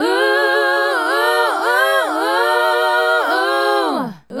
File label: Female Group, Hip-Hop and RnB Vocals